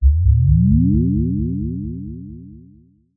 science fiction low pitched slow laser beam subtle; zapsplat